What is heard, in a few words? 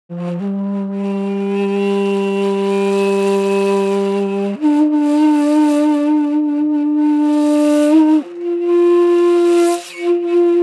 Neh Flute Dry; Itzhak; 2caudio precedence